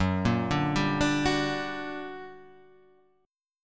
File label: G Minor Major 7th, Gb, guitar chord